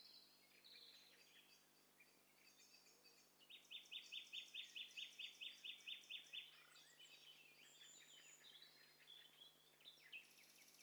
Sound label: STEREO BIRD COCKTAIL PARTY